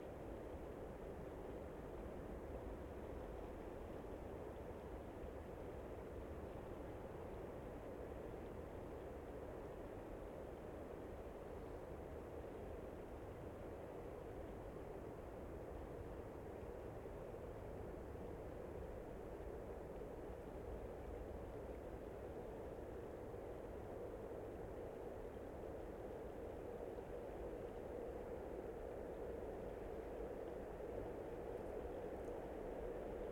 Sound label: express train medium squeaking long tails, Passing Trains; Detunized